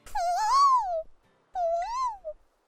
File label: foooow